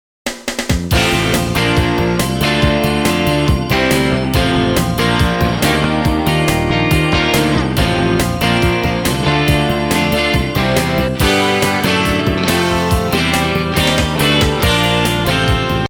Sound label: Upbeat Country